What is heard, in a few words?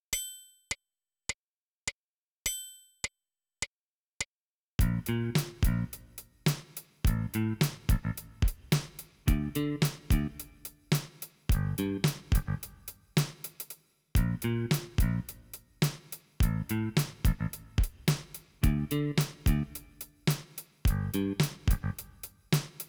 bassline; yamaha reface cs